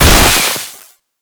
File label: Infested Survival Purification Weakpoint Destroy; Warframe; Nineteeen Ninety Nine